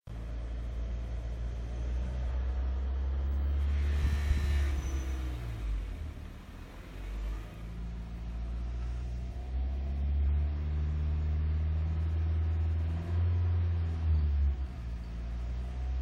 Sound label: mazda 2 metal noise just over 2000rpm car noise